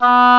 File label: OBOE B2